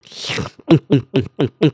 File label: drink; emotes